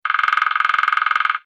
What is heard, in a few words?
woodpecker; Различные звуковые реалтоны